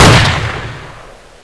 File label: Assault Fire